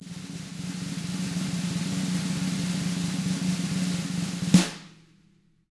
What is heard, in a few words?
roll, snare; ILIO; Double Platinum Drums 1